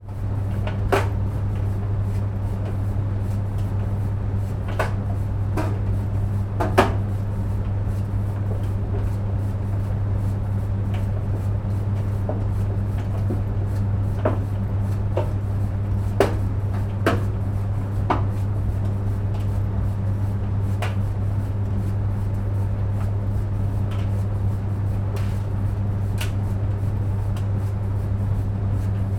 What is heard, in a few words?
dryer operate belt banging inside; household; zapsplat